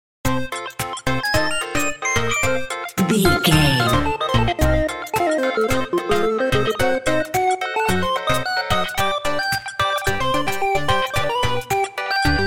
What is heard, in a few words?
happy video game level bumper